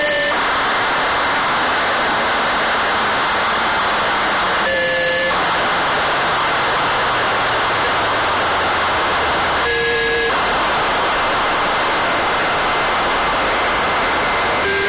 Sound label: Funcube